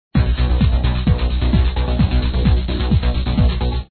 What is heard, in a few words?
Combat Music; pencak silat 2002 gamerip online